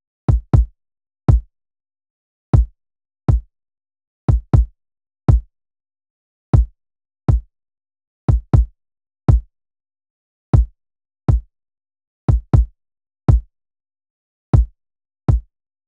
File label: Kick